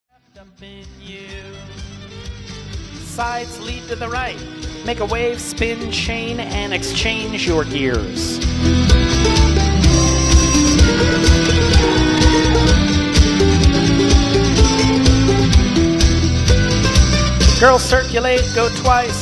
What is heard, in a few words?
singing